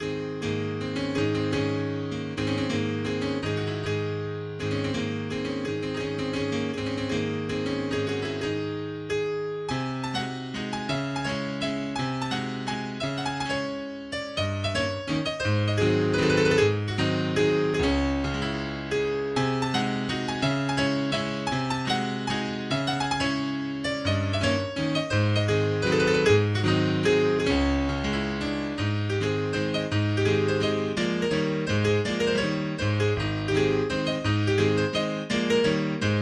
Mazurka No 2 in C Sharp Minor Opus 6 No2 Sotto voce